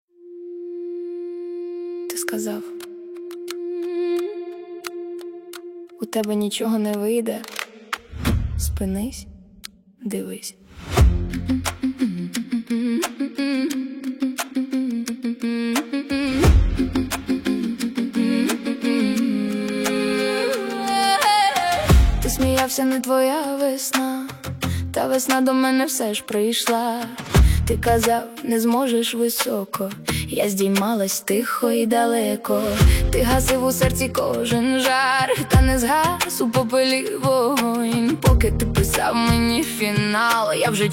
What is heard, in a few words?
modern ukrainian folk